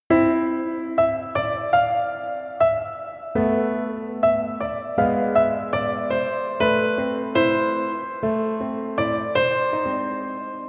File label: beautiful melancholic piece